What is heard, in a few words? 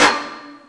hihat